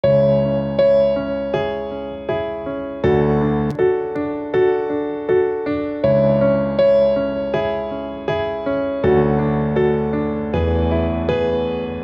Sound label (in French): piano seule